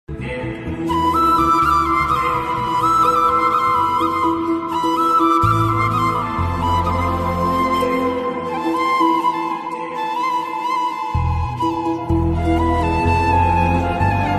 kannada ringtone kannada love bgm melody bgm tamil bgm